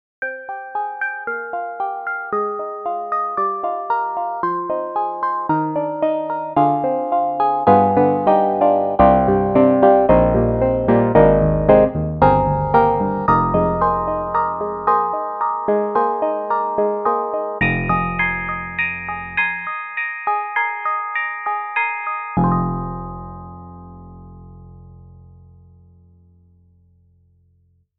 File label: FM Stock Grand; Steinberg HALion 7